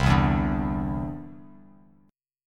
C-Major Add 9th, Bass Standard; strummed

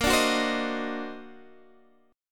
D sharp Minor Major 13th A sharp

